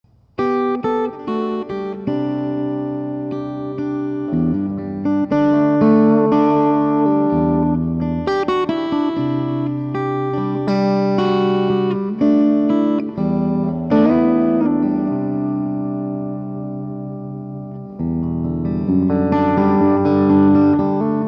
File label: Clean bridge, guitars